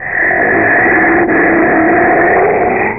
grido; MtDemo; Violator

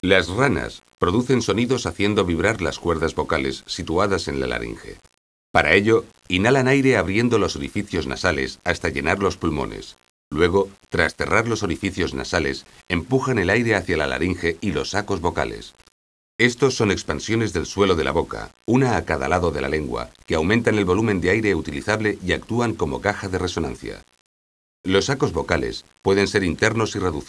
canto

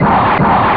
lancio

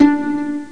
Lira; MYTH

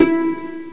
Lira; MYTH

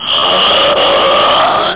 grido

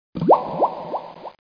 Bolle; Omnia Junior - Base Terra; Abyss

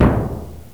Gran Cassa, Drums; GMPlay; XG

